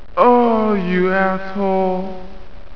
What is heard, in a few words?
die; Duke Screw'em 38D